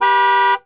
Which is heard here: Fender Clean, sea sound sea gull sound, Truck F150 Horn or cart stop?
Truck F150 Horn